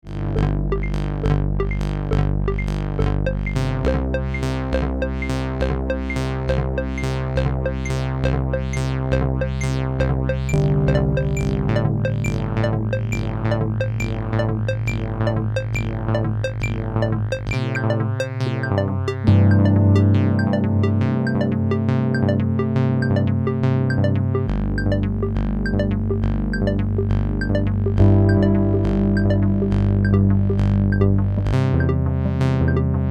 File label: roland sh2000